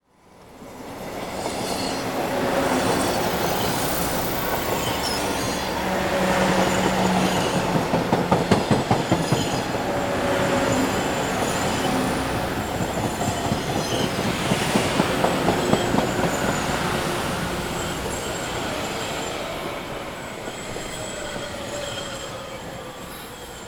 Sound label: TRAIN crossing Train; trains